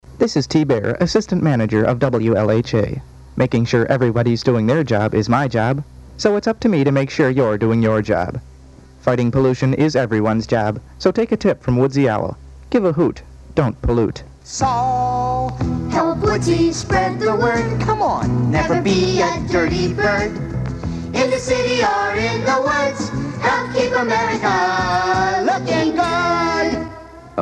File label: Woodsy owl gives a hoot